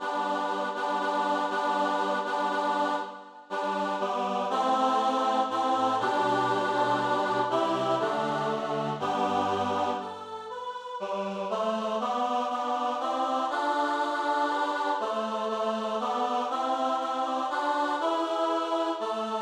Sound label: Machet die Tore weit Tenor